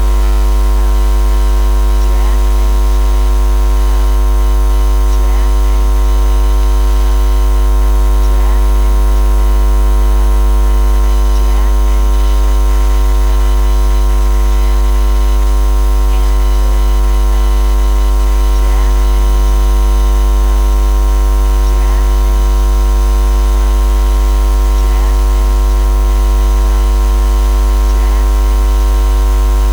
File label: jack and jill down power and recorded in computer connector with induction coil